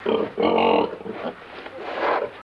Pig Grunts Breathing

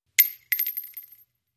Shell Case Sngle Tube, shell casing movement

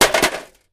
Vcr Hit Sledge Hammer; electronics

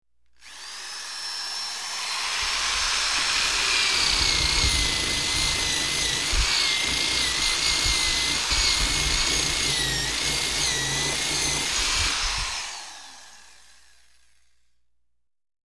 Metal Drill In Out, air tool

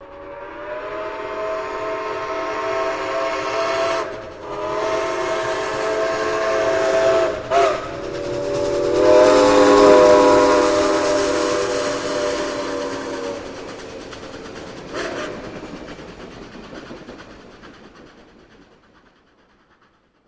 Steam Loco Med By, steam train

